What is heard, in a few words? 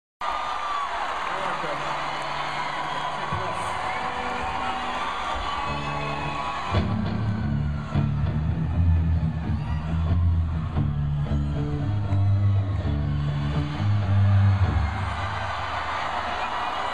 riff